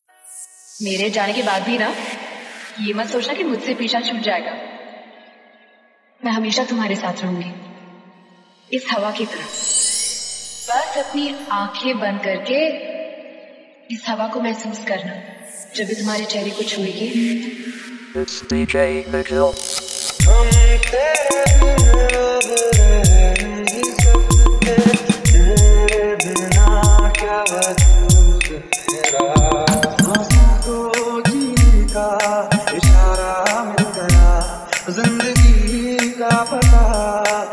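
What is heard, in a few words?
Hindi Love Breakup Mashup Song Full Pad Mix Song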